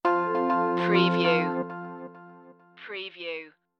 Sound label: snowdrops stinger